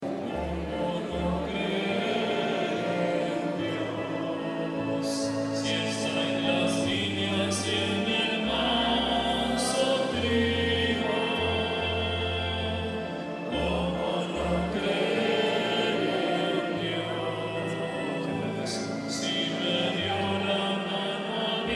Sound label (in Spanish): CANCIÓN EN MISA